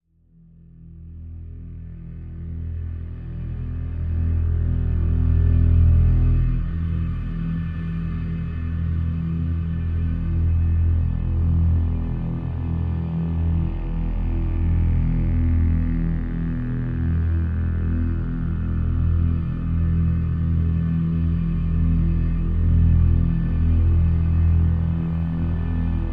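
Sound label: Drone Reactor E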